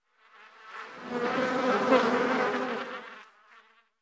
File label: insect fly; amb elements; modern warfare 2